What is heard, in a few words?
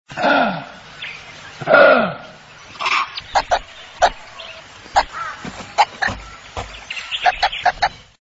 kitten; animals